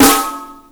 SD, Drum & Bass, 165 BPM; Ueberschall; Drum & Bass Resonance

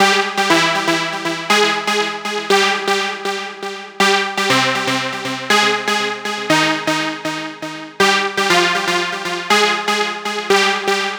Trance Synth

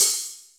cymbal, Roland MC-909

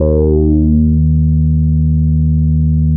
TALK D#2; Boom da Bass 3; E-MU Producer Series Vol. 6 – Analogia Project

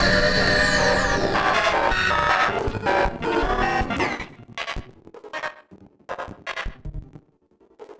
Battered Synth; malfunctioning synth samples

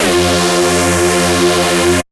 lead fizz F; leads

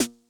Snare High Tuning Low Tone Max Snap, 909; classic machines

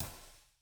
CYMBAL, ELECTRO KIT

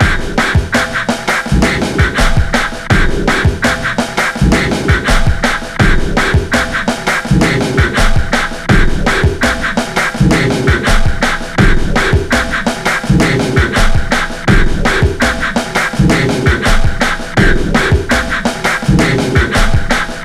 WEIRD BEAT, 83 bpm, Breaks; POTBreaks

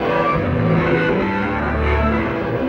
Burning, Electric Guitar; Sonic Foundry (Sony Creative Software); Friendly Fire; Wrath

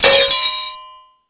Crash, Drum Machines E-MU Pro-Cussion

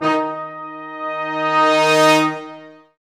BRS, Lrg Ensemble; Roland L-CD702

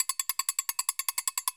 CLICKS; ILIO - Ethno Techno

